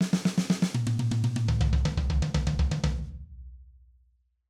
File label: Triplet Fill, drum